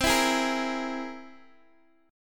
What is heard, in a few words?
C Augmented 7th, Guitar, Open D